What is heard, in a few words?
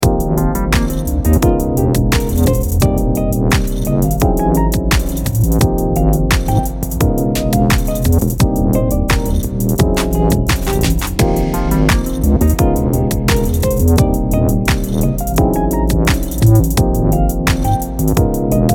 Jazz Dubstep